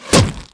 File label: KNIFE HIT; outlaws